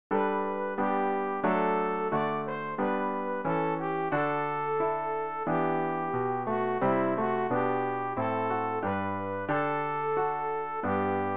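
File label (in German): sopran, Übehilfen für das Erlernen von Liedern; rg 159 liebster jesu wir sind hier dich und dein wort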